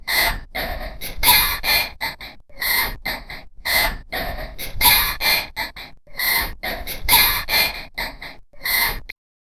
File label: a loop of a young, breathing